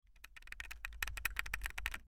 Keyboard clicking

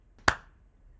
clap

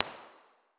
Suburban Garage, environmental impulse responses; MIT environmental impulse responses

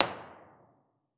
Parking Lot; MIT environmental impulse responses

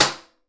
Bathroom; MIT environmental impulse responses

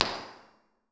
Classroom; MIT environmental impulse responses

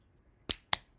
clap

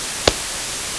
clap noise; claps

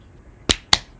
clap

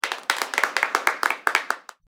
Audience applauding

